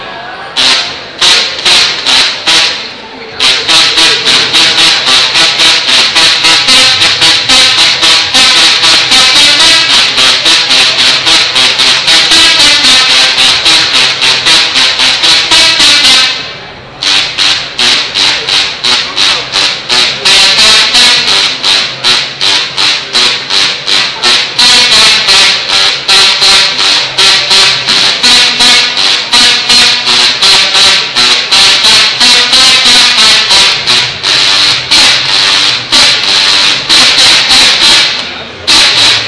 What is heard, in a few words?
tesla coil organ in operation; makerfaire UK